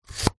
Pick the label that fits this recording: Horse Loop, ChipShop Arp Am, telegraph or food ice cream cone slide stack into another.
food ice cream cone slide stack into another